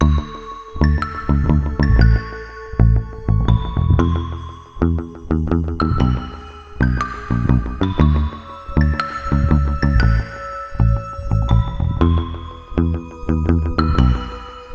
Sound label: Cave; curious expedition 2 macos ps4 switch windows xbox one xbox series xs gamerip 2021